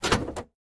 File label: Cruiser Open Hood; lethal company